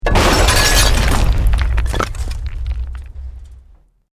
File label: EXPLOSION MEDIUM BRICK WOOD GLASS NO FIRE; Created explosion effects, house, building or vehicle effects; Bomb